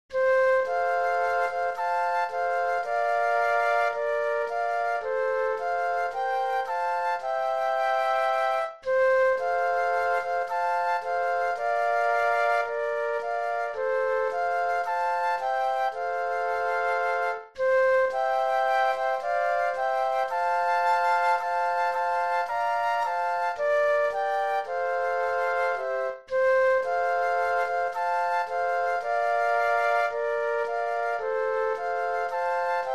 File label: Flute Trio